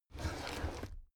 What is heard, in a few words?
drawer cabinet crammed open regular halfway